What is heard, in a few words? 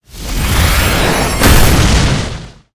boss trap deth